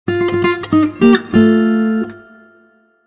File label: Level Up; easy solo guitar